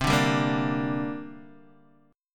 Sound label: C 7th Suspended 2nd, Guitar, Open D